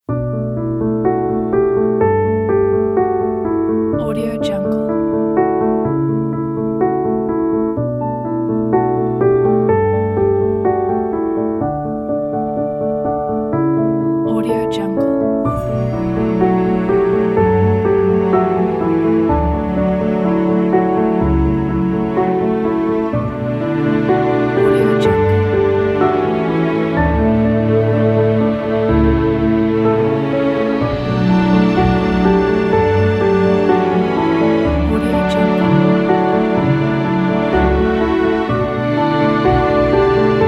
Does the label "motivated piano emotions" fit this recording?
Yes